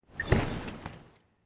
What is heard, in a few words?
armor; Smite; god Khepri